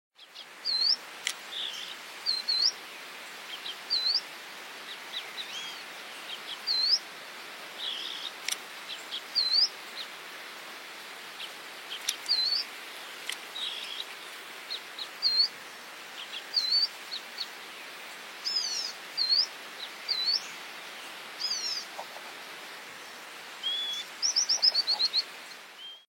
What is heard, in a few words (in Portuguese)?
canto do balanca rabo do nordeste